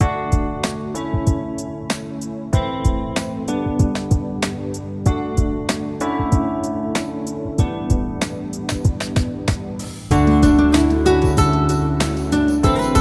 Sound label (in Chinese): 游戏背景音乐